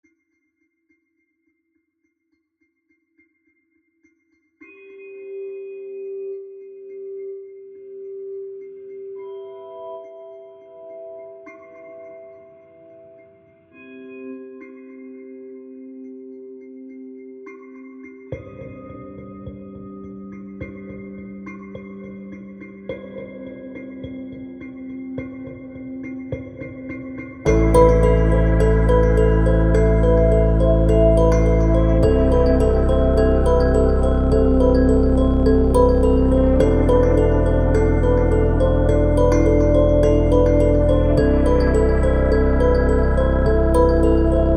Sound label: dark kalimba; all in